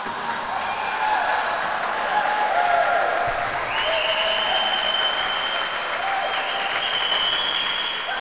Run; wesole przedszkole bolka i lolka; olimpiada letnia

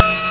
DIGI DING

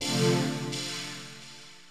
SYNTHPAD, HOUSE, 125, A; eJay; Sphere

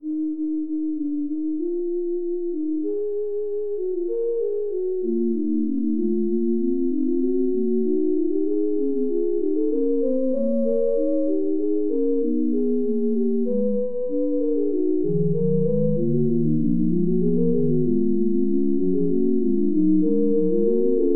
fugue; spectre

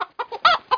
chicken; Pong

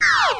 Disintegrate; Querkz 1.5

